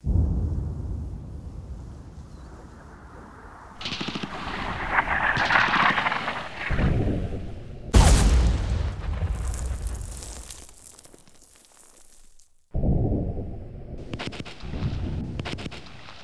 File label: ambience